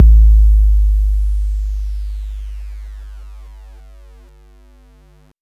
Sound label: bass 808 c; amigo; M8; M8JUNGLEPAK1